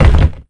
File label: pissed; sanmon